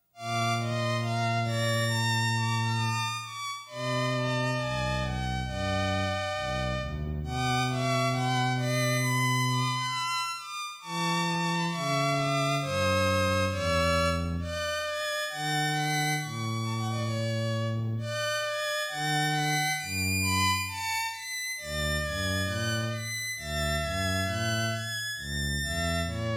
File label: string trio